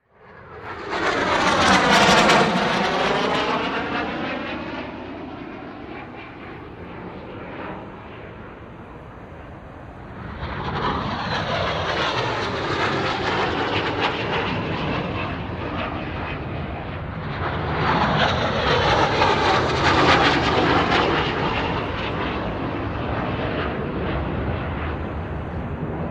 RAF Hawker Siddeley Harrier, three fly overhead (some tank engine noise in foreground); Airplane Sound Effects; BBC